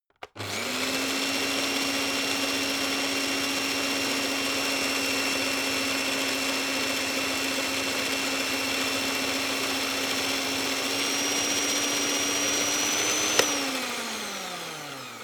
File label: electric whisk spin long; haus-garten; kueche